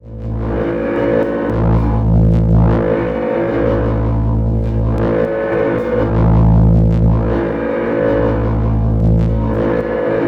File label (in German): Korg volca modular Drohne